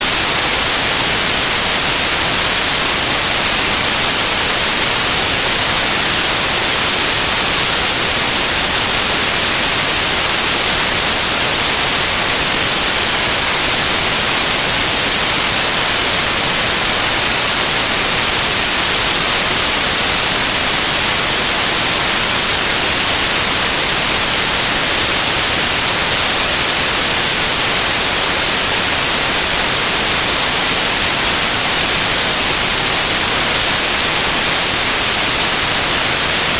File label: white noise 30min